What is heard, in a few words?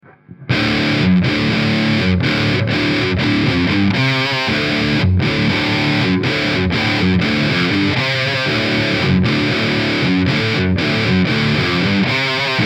3 dubs tight dry wet; tc electronic mimiq doubler